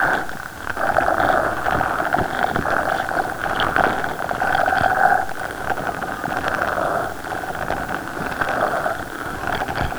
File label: Snail Eat